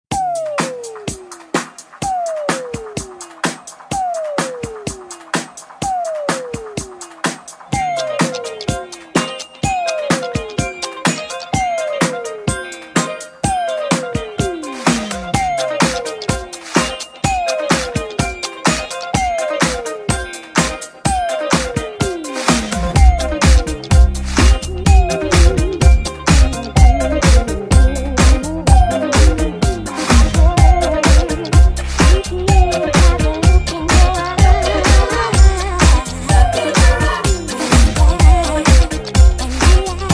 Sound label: disco remix